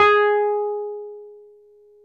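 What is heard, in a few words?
Wurlitzer electric piano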